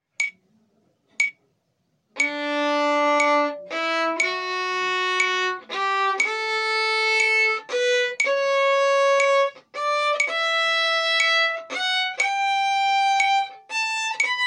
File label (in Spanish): escala de re mayor en negra con puntillo ligada a una corchea con metronomo